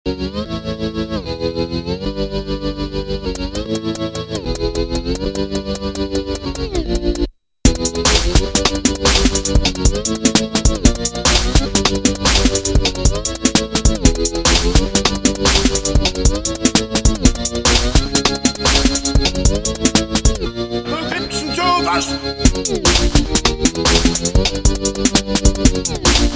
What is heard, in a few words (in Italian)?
organo